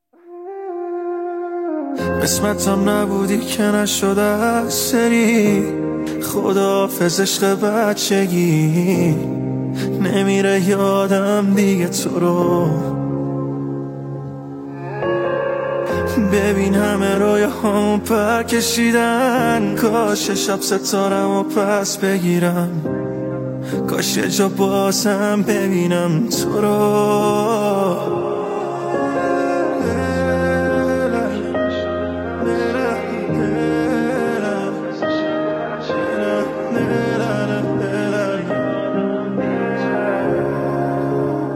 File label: Piano